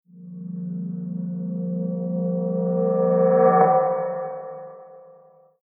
Reverse Wind Chimes Long Shimmer Low Pitched Riser; Sound Design; TitleFX; Rise And Dive